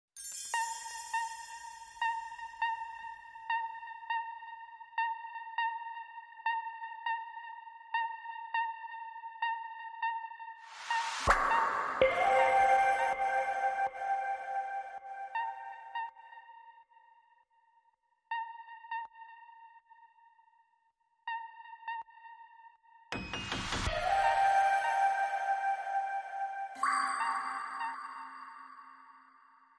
Lead Motif and FX Only

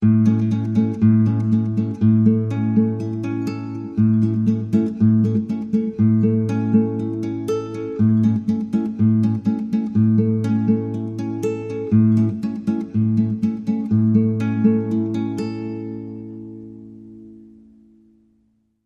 Guitar Classical Bridge

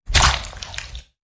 Muddy Water Hit

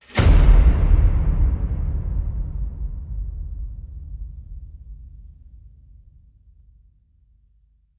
Hit Big Blitz Strategy

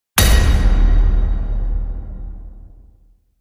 Hit

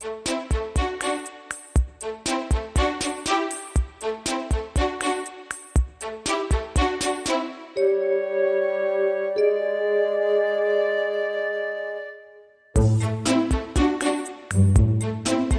Traditional Percussion

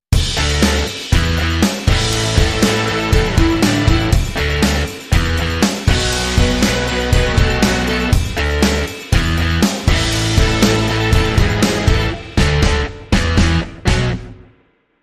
Funky Fuzz Rock

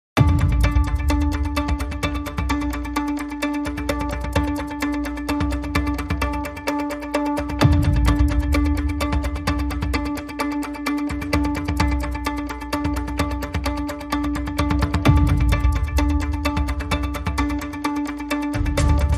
Clocked Strings

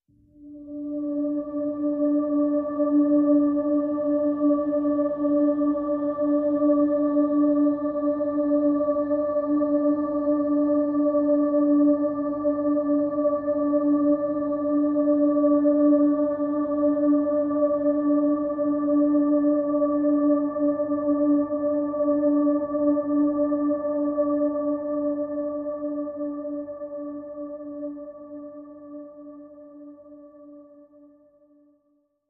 Harmonics D

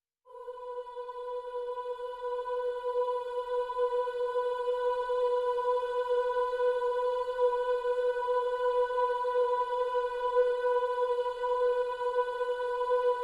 Pads Vocalicious (B)

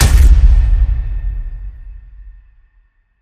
Hit Big Disinformation

